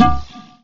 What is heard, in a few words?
character portal out; ZombieEfc; IdleRPG2024